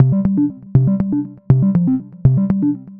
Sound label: Bleep Bloop, Synth Textures; Sonic Foundry (Sony Creative Software); The Electronic Music Manuscript Collection